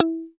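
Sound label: funky strat guitar; 90's Sample CDs; Club-50 - Foundations Roland; Funk & Mute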